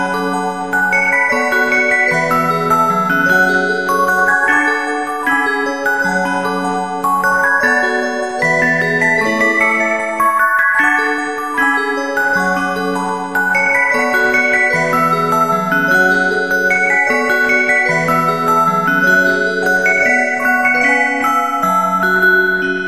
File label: Music Box